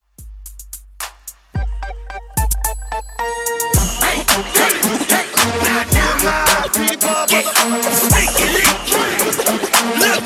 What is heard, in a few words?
Mixshow Scratch Edit In Outro 110 BPM